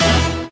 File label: super meter win line; mega joker